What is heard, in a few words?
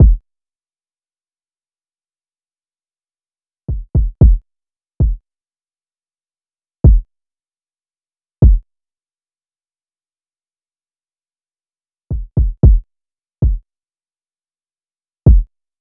Drum Loop 114 BPM Kick; Loop Stems; Cymatics; Vibes II Drum Loop Collection; Prize